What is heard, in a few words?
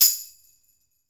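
tambourine open, GM drum set; AKAI S-Series CD-ROM Sound Library VOL-8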